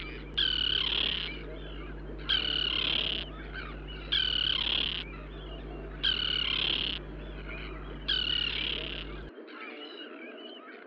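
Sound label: common tern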